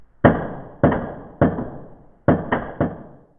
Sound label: church, monastery; soundscapes